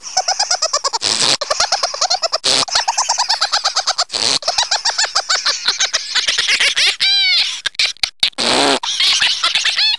Cat laugh

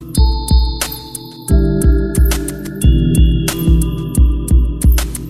Ambient

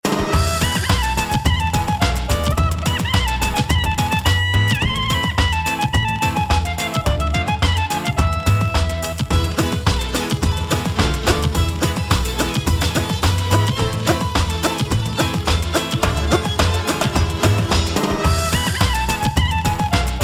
Balkan Jiddisch Mix

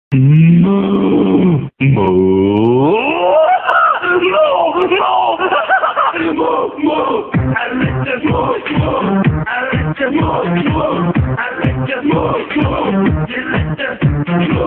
Moo Moo

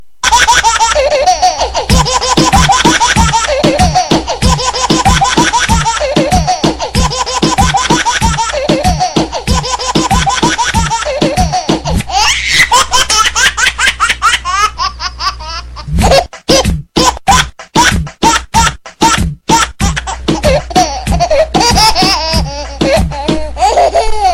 Laughing Baby

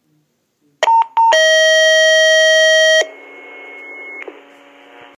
Tone Drop

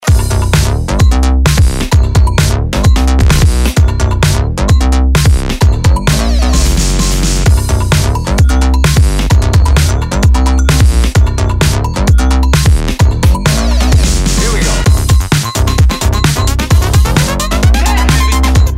More Cowbell

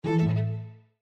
Violin alert sound

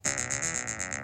80s synth

